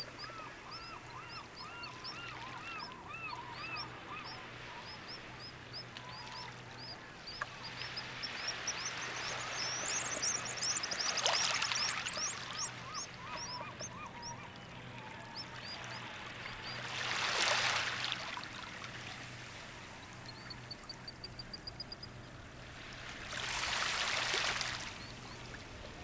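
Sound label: pigeon guillemot; Kinneypoint SP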